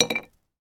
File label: biscuit cookie drop onto plate; food; sound effects; sound effects one